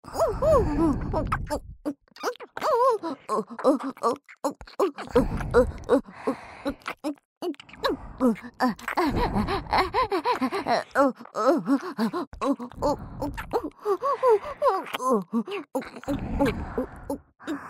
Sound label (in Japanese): オホ喘ぎ SE無 ＋両耳キス; おまけループ（オナサポ）; 3WAYループ